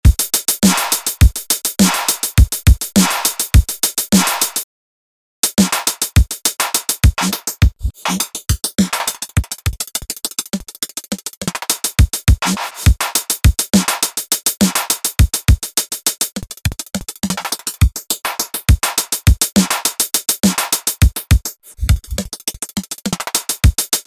arturia efx fragments

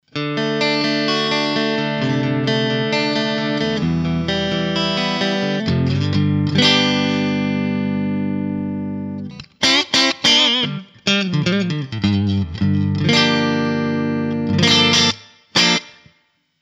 fernandes retrorocket pro middle

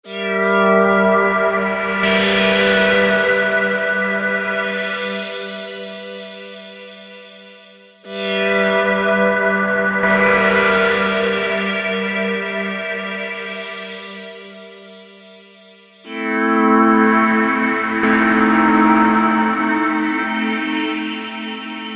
afterglow pad; arturia v collection 8; jun 6 v

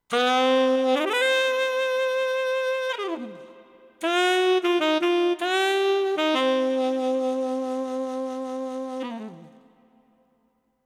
yamaha tyros 5 ensemble jazz sax

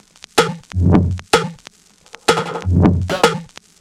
toploop 126

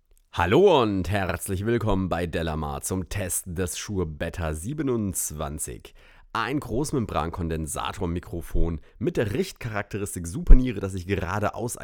shure beta 27 vocal

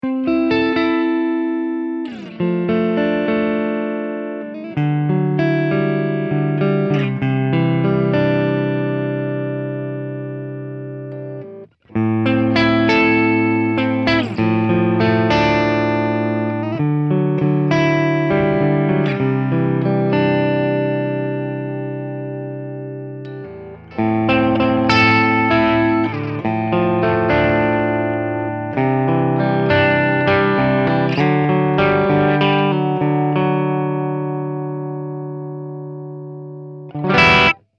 Harley Benton TE90FLT clean

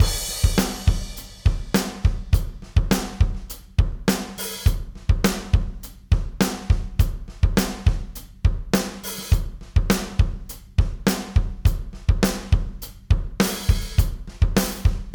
drums dry; tegeler audio manufaktu vtrc

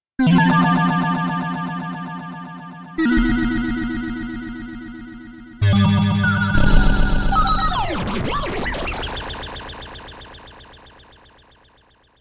nord lead a1 weird

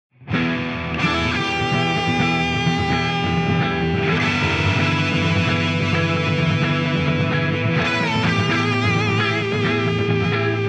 Spark all in; mercuriall

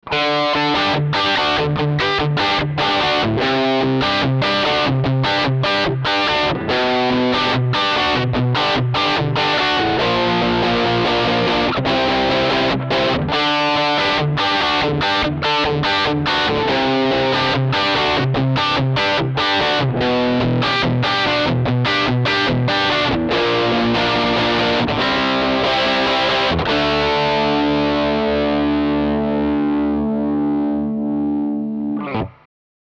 harleybenton ex 76classic

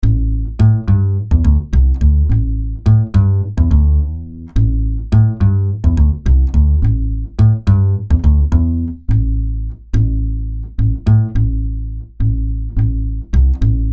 Ircam dry; motu machfive 3 testbericht

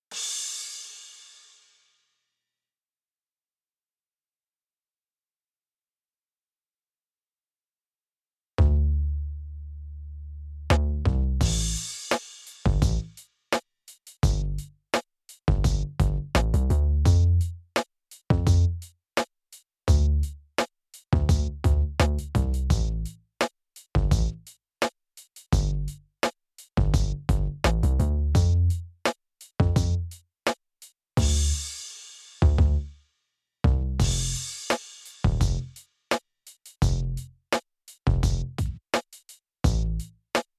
rhythm group ohne parallel compression

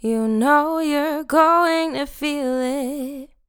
vocal pack dry; ghosthack free vocal pack

